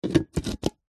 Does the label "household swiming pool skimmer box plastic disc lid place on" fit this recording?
Yes